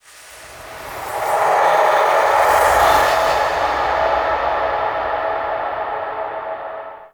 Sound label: Champion Hub Texture, Ambience Dungeons Under City Champion Crypt Hub